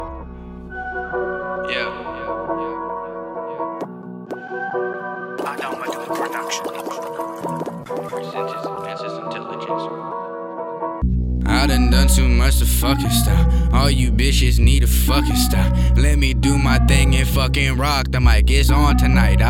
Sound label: Rap Style, Hip Hop